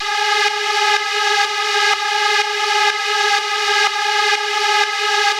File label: warm synth dry; kern audio